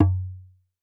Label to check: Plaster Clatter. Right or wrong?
Wrong